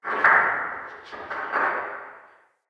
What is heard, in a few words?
Fort Corner Tower Stone Creak; Ambience